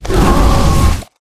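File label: Dragon Skeleton dodge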